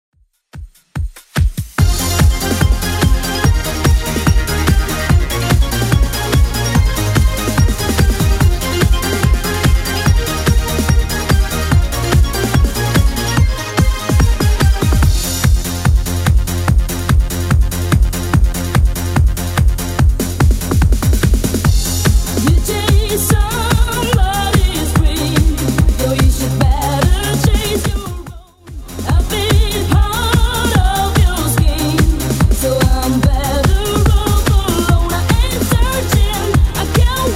90s Redrum 145bpm

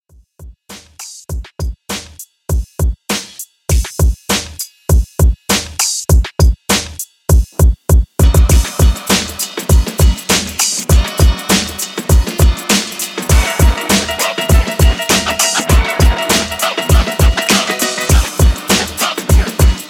80s Redrum, 100 bpm

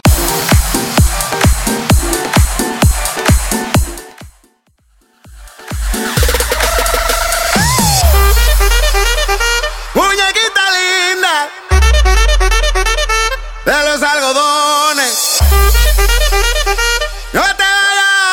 Latin Bootleg Festival Mix 130 Bpm

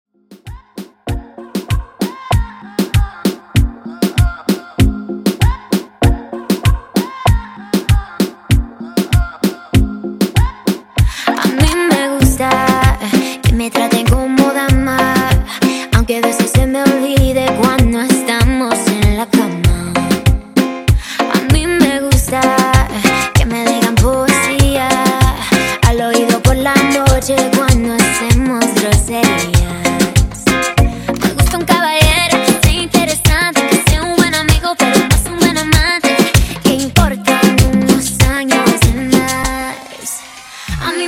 Reggaeton 97 Bpm

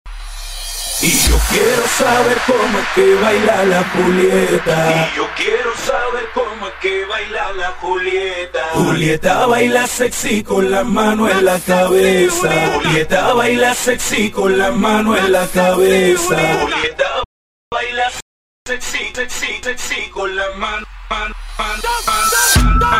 Acapella Started Remix

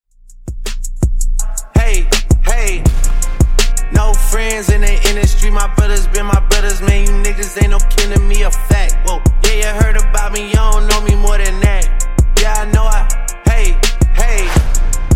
82 Bpm; Dj Intro Outro Edit

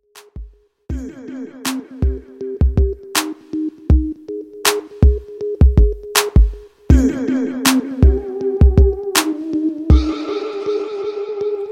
Hype Intros 160Bpm

